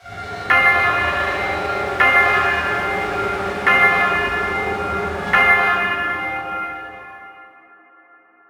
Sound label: symbols rip; DraculasCastleWD; wazdan